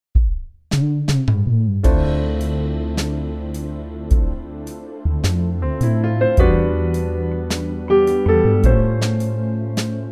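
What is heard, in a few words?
Dm Smooth, backing track; key - Dm - vocal range - A to F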